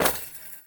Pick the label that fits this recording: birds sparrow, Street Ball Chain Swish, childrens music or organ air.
Street Ball Chain Swish